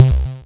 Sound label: Lead, 8-Bit